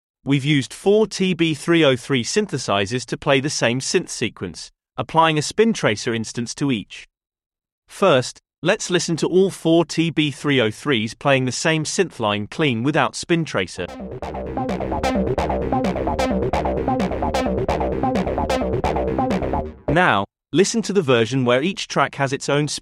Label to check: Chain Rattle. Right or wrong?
Wrong